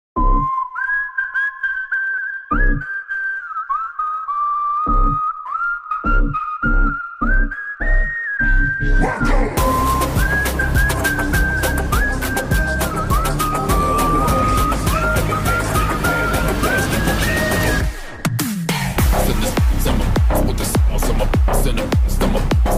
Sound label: bgm ringtone, Malayalam; marco; unni mukundan